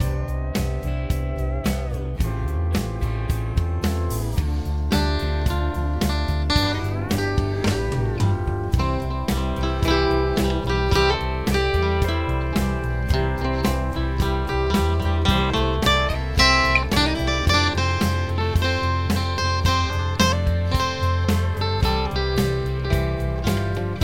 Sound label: guitars; Solo Guitar Music